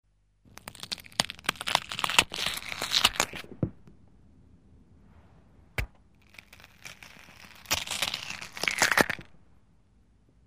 Eyeballs Being Gouged, horror sounds; Hollywood Edge

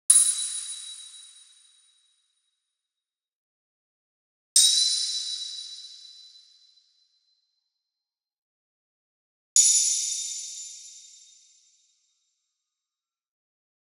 BLING Glass Crystal Freq Shift; boom library; Anime Essentials

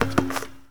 Street Ball Wood Back; SFX Kit

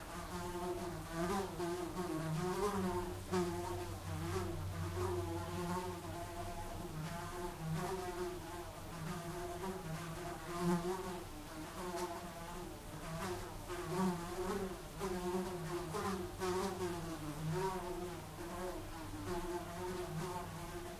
fly buzz; DeadFright

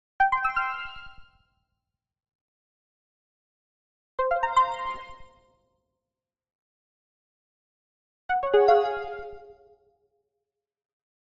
Arcade Jingle Neutral

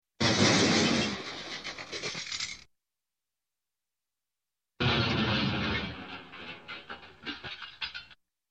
Crash, Junk Junk, Crash, Explosion; Sound Ideas 2000 Series

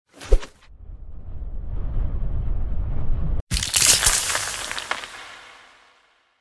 Bone Fall; 10M

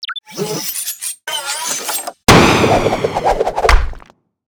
Space Age Grappling Hook, Sci Fi; 1001 Sound Effects

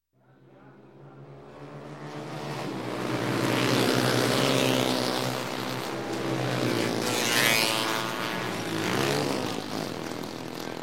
Motorcycle, Race Lightweight Vintage Pass By; announcer; Sound Ideas; Series 2000

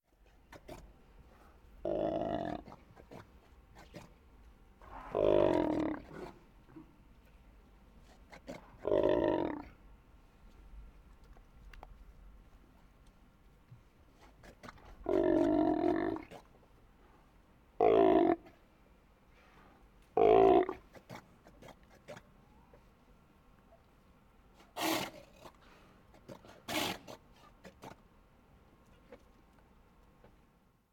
Animal in cage roaring; KMP Film Video Sound Effects

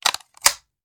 Machine Gun Foley; Sound Ideas; Guns

